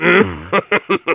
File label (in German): Soldier laugh short, de